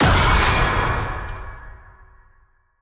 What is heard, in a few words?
Soul Shackles Detonate; EnemyBoss; bloom minigame